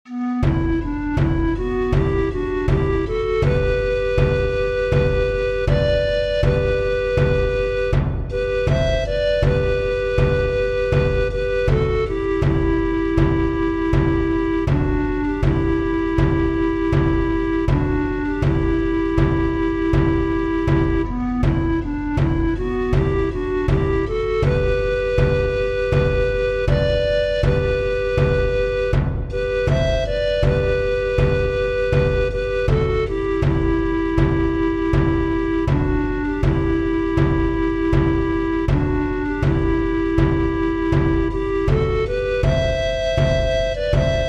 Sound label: melody harmony bombo